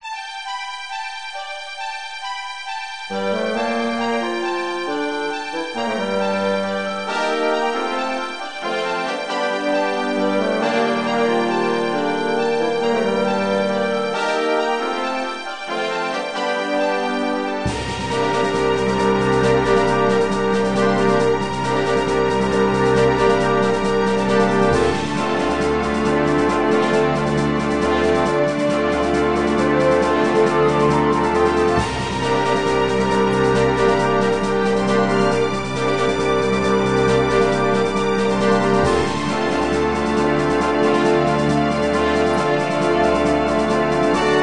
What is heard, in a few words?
vs bgm